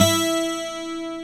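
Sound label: 12STRI, 12 string guitar; Roland LA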